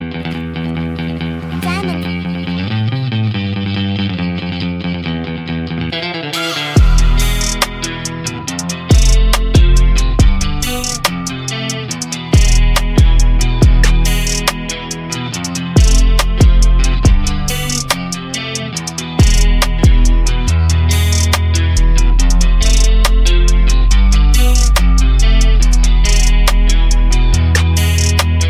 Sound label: Rodeo Instrumental